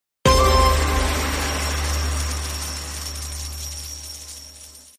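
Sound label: end of mega win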